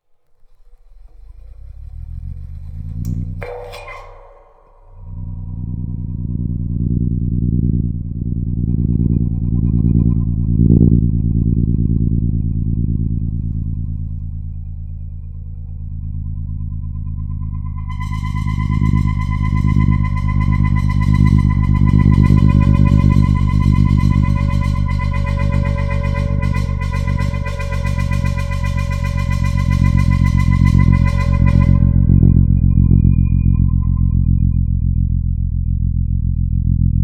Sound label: Cymbal Song Spin Top Acoustic EM Pick Up